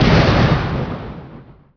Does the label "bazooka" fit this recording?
Yes